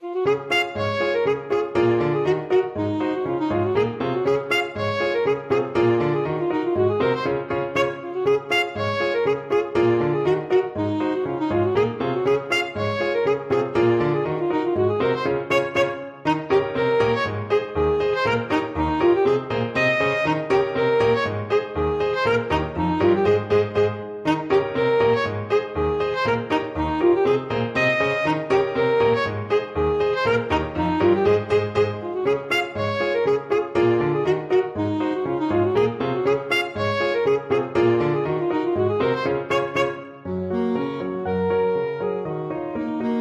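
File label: alto saxophone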